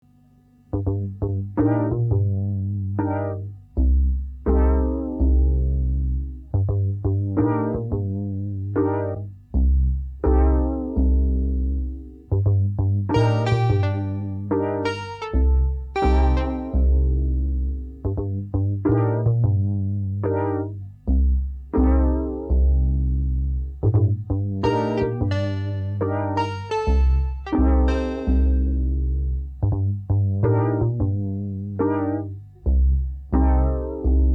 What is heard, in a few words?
Slow Unusual